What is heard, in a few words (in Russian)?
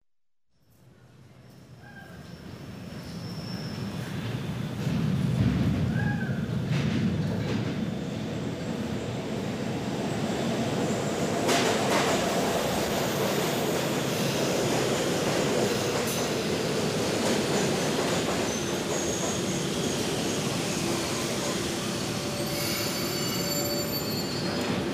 metro ctanciya pribitie i otpravlenie poezda